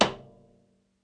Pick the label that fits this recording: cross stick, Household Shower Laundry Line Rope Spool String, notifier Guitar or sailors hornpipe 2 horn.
cross stick